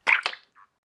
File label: slosh; Lewd SFX